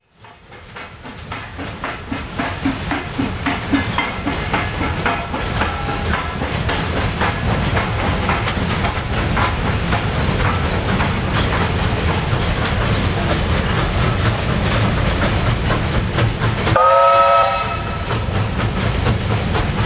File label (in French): locomotives a vapeur; sonotheque